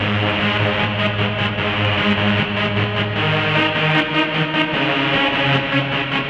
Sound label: synth, Hardcore Breakbeat; Big Fish Audio; Hardcore Breakbeat Action; Robot Live